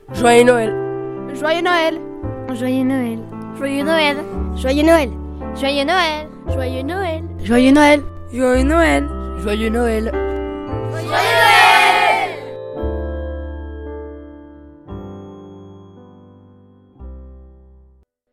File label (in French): JOYEUX NOEL